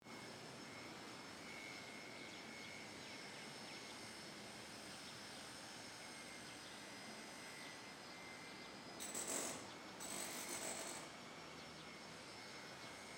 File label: street construction birds; atmosphere